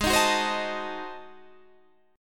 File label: G sharp 7th Flat 9th G sharp down Guitar Standard, chord; G#7b9; strummed; JGuitar